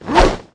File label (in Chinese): 壮男攻击状态喊